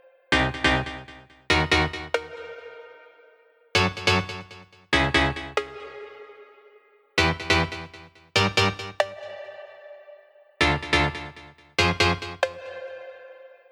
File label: Laserbeam